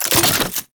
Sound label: Alchemy Grenade Drop; Entrati